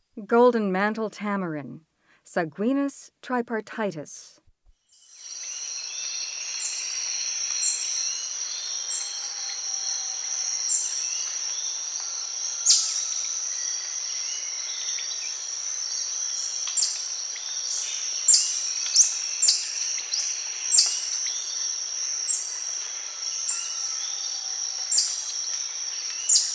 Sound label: Golden-mantle tamarin, Primates of the Rainforest